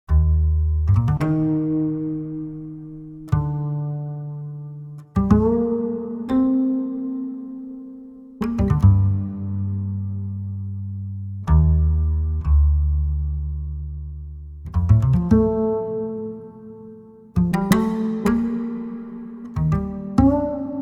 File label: contrabasoo; Muzyka Ilustracyjna